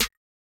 Open Snares

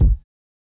Not Nice Kicks

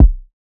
KICK

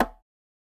woodblock; Percs

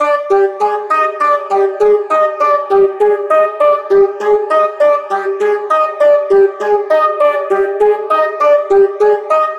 Loop

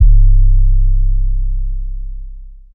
808's

